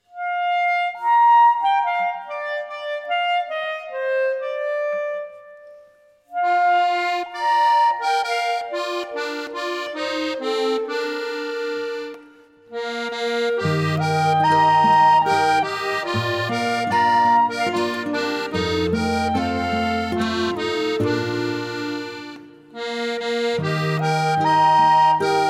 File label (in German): Klarinettenweise